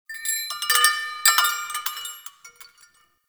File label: Witch Station Chime Jingle; Ambience; Soul Plane